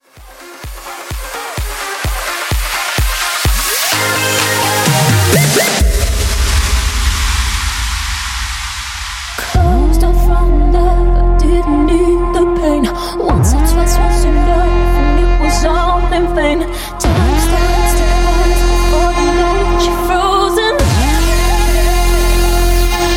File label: Future House Megamix